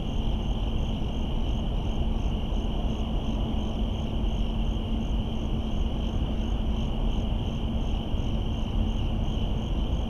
Suburban night crickets traffic roar; urban and suburban; boom library

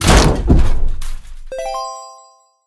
hit cyclers; Blind Drive

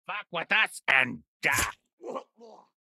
goon approuch; Blind Drive

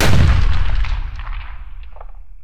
Gun, Rifle, Cannon Like Blast; gun and weapon sounds; captain audio; fully loaded

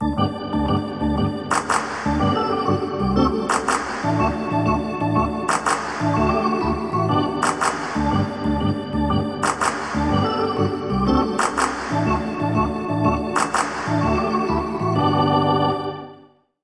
Hockey Organ

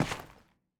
Footsteps; Hero; Blind Survivor

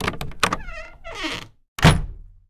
door wood small; DeadFright

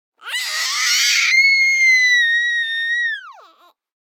BABIES SHOUT Girl 12 months High and Long; Baby Boom

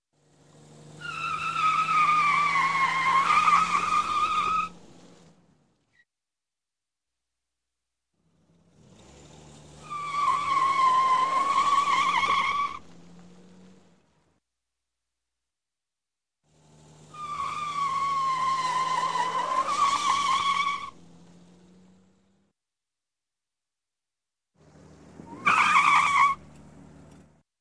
Auto, Tire Squeal Squeal Tires While Pulling Aw; Skid; Sound Ideas 2000 Series

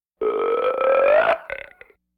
Vocal effects breathing Moaning Baby

